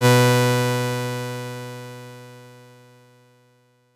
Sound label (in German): Gesamt Chor cc 415Hz, Leise Lauten; (Saiten 8 und 9)